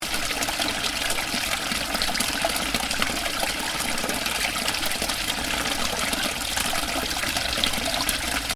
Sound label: Water pipe loop, Water: running from pipe into stream, loop; Stuart Duffield